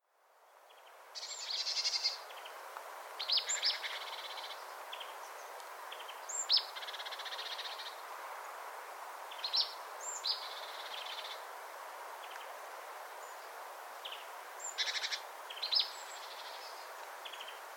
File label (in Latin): Poecile palustris; SETE